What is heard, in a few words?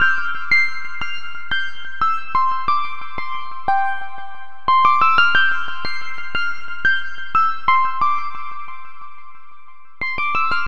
Little Lead, lead